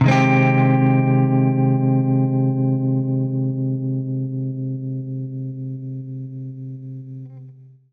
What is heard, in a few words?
Baritone Chord Cminb6, Guitar Chords; undercover samples